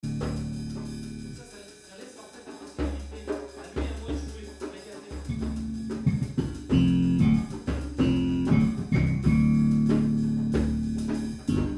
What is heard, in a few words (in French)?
Basse Percu